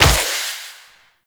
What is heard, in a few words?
Reaper Wall Run Step; Wraith